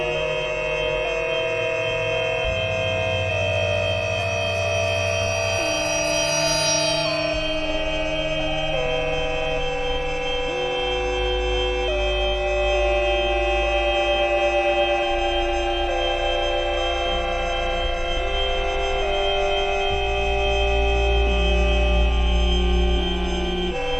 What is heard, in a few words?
INTERSPACE, Anime Sound FX, AMBIENT